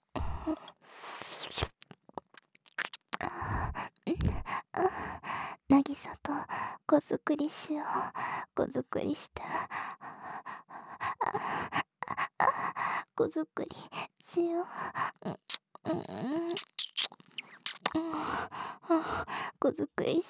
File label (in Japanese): 耳舐め 喘ぎ